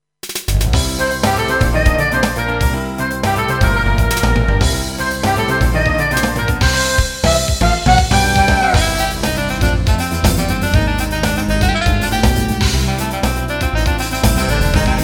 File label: drums, music